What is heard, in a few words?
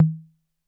tom; MFB-522